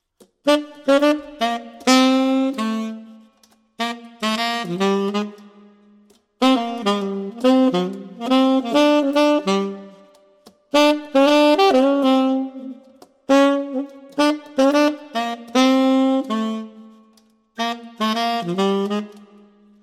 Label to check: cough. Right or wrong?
Wrong